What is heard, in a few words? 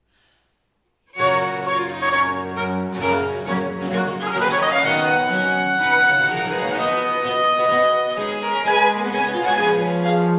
Purcell Dance for the Green Men, Ensemble für Alte Musik